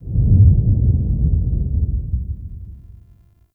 Under City Alley Low Rumble

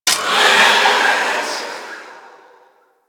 Grimoire Vulnerable Impact; Enemies; Warframe; Entrati Doppelganger

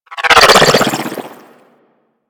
Flak Drone Projectile By; Corpus

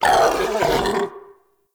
Battle Dog Death; Animals; Vocals

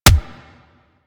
Weapon Punch Shotgun; Warframe; Weapons; Shotguns

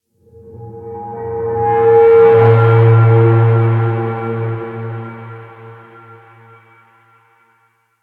Chamber Drone One Off; Orokin; Warframe; Sacrifice

